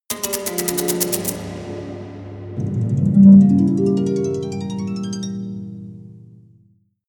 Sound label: strings buzzed harp down up